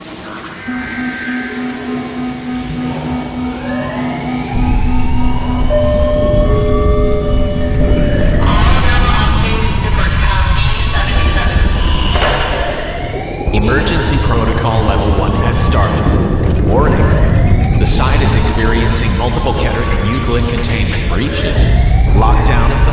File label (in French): alarme breche generale; hors serie en scp